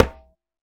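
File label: Conga Lo Mute; Roland BK-5; Percussion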